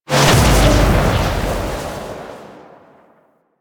Void Dragon Orb Cast; Enemies; Abilities; Warframe; Duviri

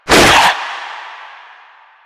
Runner Arm Attack; Enemies; Warframe; Proto Infested

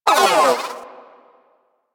Vocal Pain, Pets; Warframe; Zanuka; Zanuka Companion A

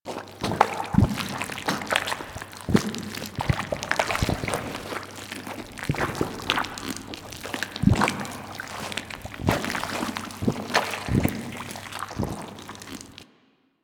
Goop Slime Fall; Ambience; Props; Warframe; Infested Eris

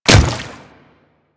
Water Step, Footsteps; Royal Guard

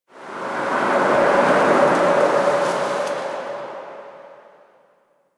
Exterior Forest Wind; Warframe; Ambience; Duviri

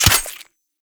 Melee Dagger Impact Flesh

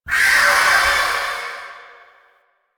Blade And Whip Heavy Vocal; Weapons; Melee; Warframe; Dagath

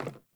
Sandals Run Wood, Footsteps

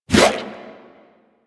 Sword Swipe, Whooshes; Warframe; Weapons; Melee; Pacifist Sword And Board

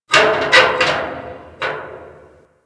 Metal Clunk Squeal; Ambience; Props; Warframe; Orokin Derelict

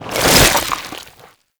Infested Bone Blade Mouth Foley; Warframe

